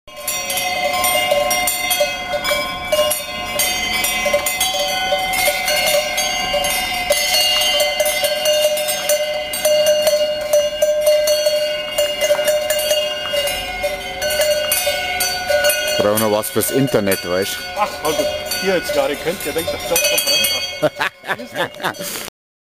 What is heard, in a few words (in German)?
kuh